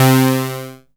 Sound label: SYN, KEYS; Alpine Volksmusik